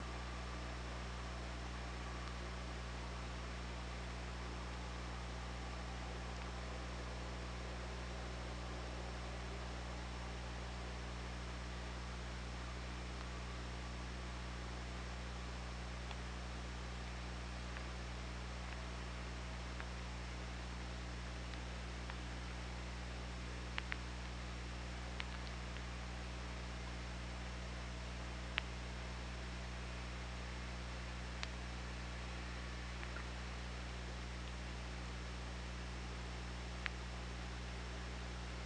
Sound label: OrcaLab